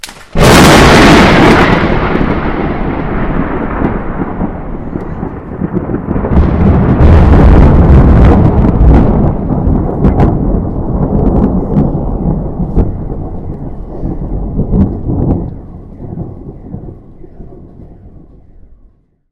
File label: sound of thunder